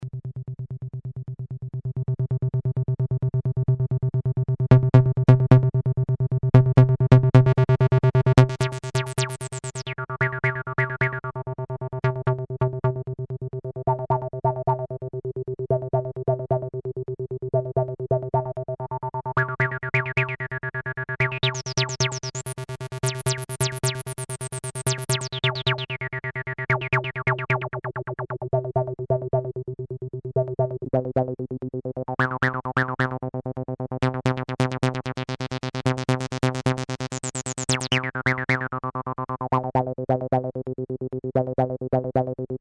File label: RE-303 Test; Din Sync; BowBow